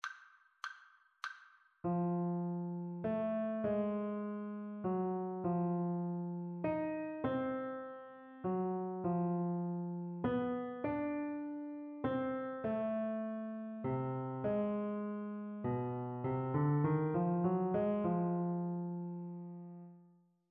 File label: melodic dictation; ear training